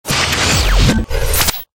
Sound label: Glitch Combi Sequence